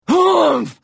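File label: Hit, Khajiit female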